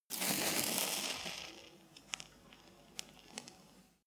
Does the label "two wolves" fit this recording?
No